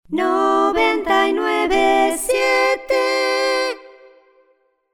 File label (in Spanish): 997 dial cantado jingle